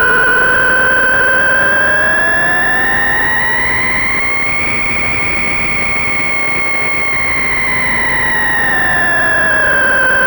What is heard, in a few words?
WIND RATTLER; Zero-G Ambient 1; WINDRATTLERS